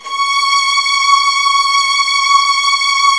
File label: C#3, Virtuoso Strings; ILIO; Kirk Hunter